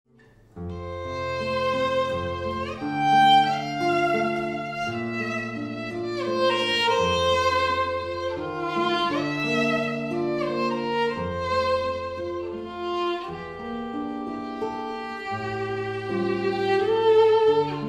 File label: Violin Guitar Duo